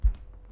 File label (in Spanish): Paso descalzo alfombra; Yesterday Origins